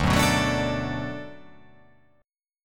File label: D 6th D down, chord